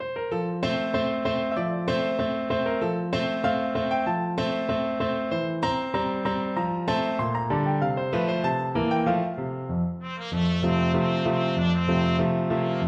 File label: yellow rose TPT, trumpet